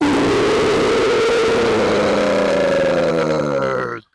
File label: uuaarrgh